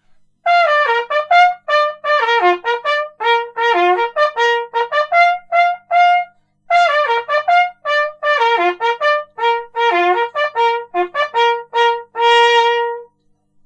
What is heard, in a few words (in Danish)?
bekkasinen